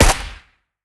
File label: fire, weapons mini14; 762 supp; Urban-Coalition; Decay; arccw ud